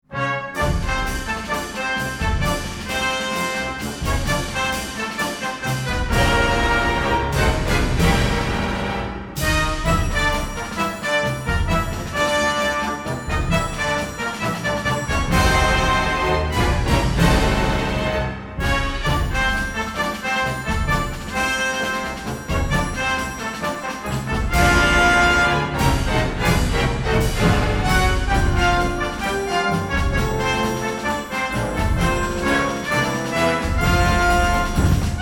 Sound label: Marching Band Newsreel